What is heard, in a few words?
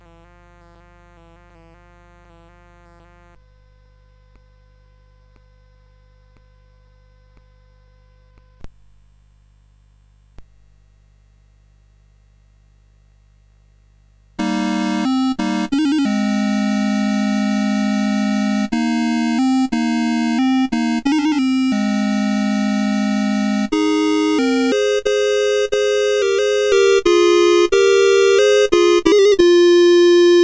Arab Dance, CSG8580R5